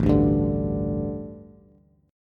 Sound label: A 7th Suspended 4th, A, down, Bass; A7sus4 strummed